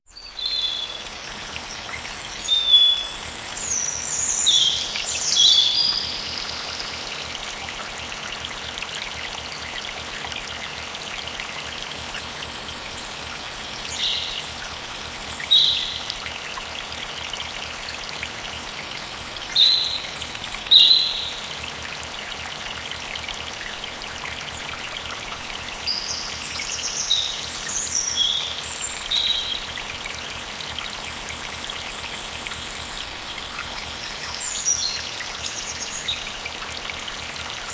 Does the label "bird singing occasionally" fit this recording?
Yes